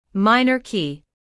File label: minor key